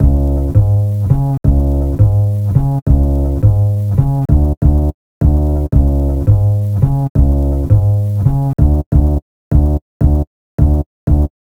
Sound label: Bass, Hip-Hop